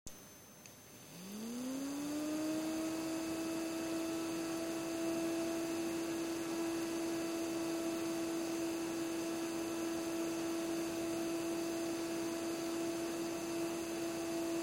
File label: new fan